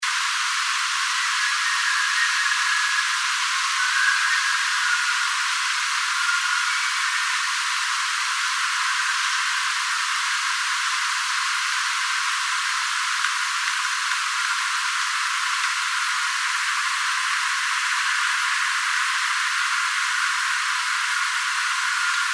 whistling

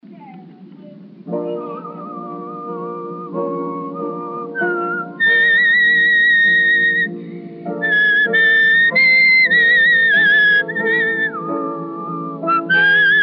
Whistling

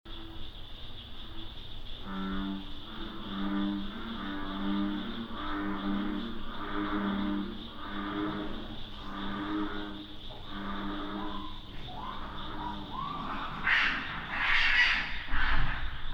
Raccoon; Northern Raccoon (Procyon lotor); North America

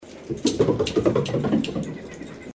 Running Up Stairs

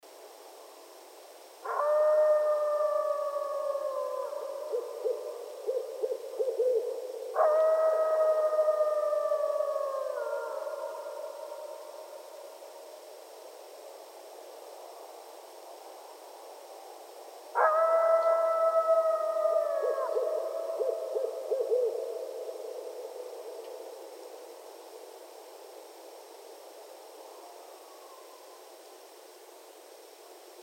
Coyotes, Night Sounds; Canis latrans

